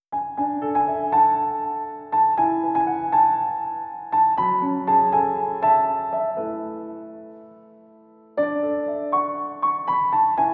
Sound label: piano arrange; Hakuisei Aijou Izonshou; Sunny Place